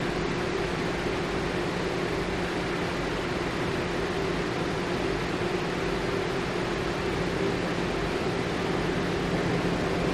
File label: Boat Engine Steady